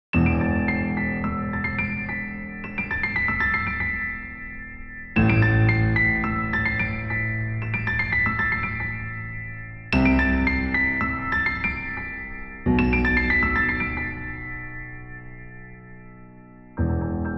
piano cover

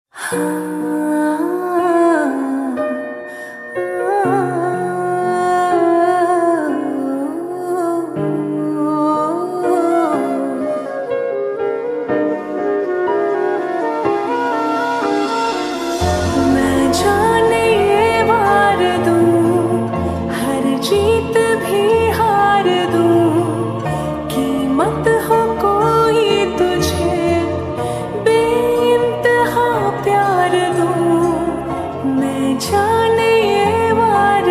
female cover slowed reverb

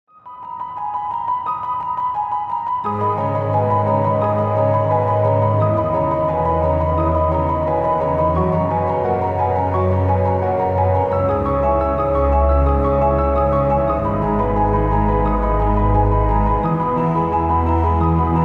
orchestral version

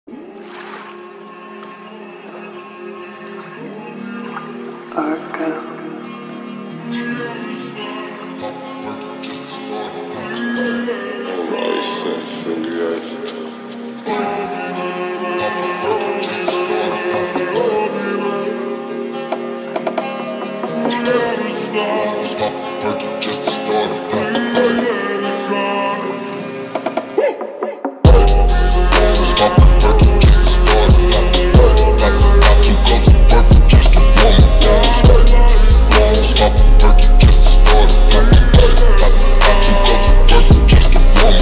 remix slowed reverb

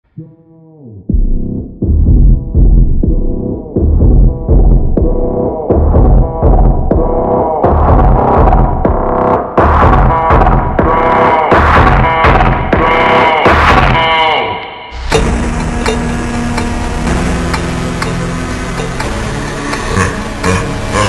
funk super slowed reverb

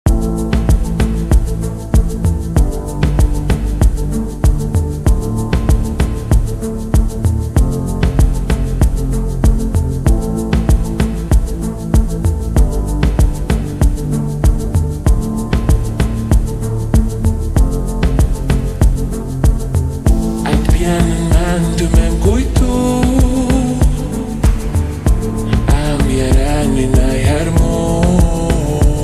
slowed and reverb

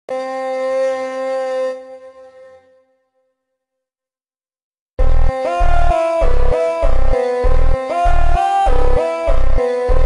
funk remake super slowed

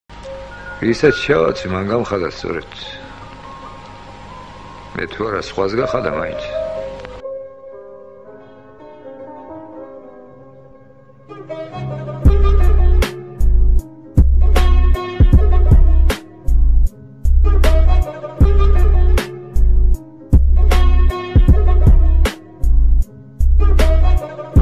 trap remix

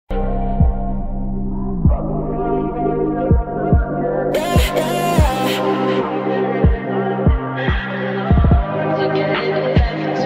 looped guitar solo

